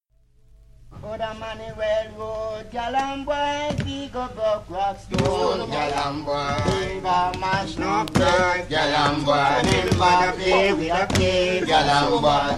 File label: Chorus, Jamaican Ring play or game song; Emmanuel Road & Mango Time; mento